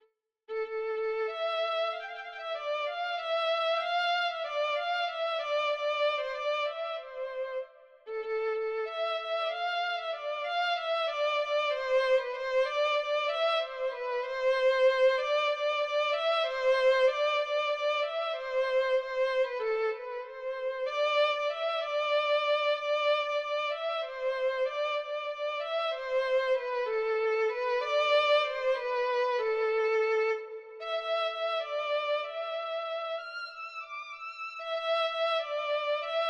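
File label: violin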